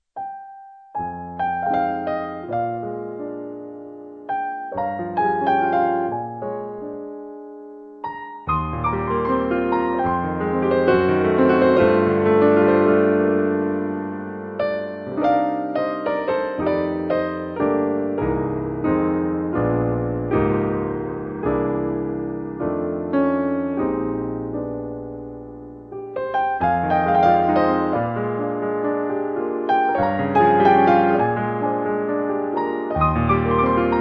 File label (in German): am piano